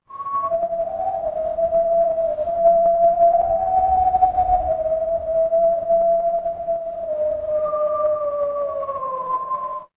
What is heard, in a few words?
ambience wind